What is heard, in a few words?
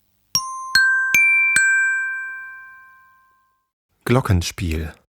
Glockenspiel